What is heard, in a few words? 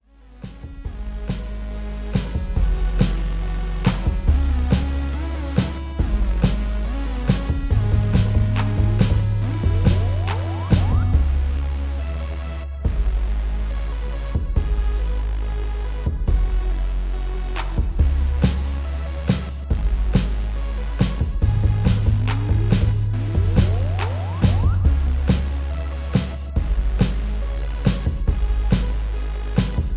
70BPM